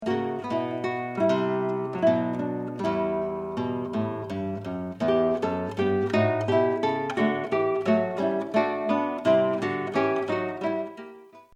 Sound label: saraband